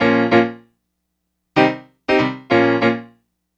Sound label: Acoustic Piano